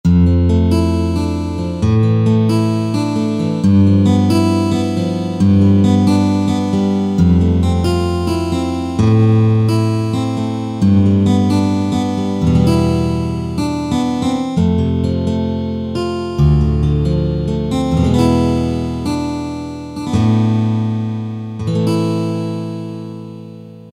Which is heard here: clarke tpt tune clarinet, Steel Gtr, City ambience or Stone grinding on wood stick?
Steel Gtr